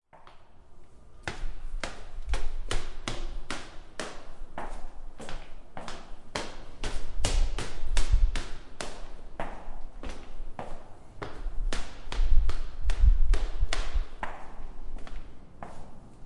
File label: Stair Climb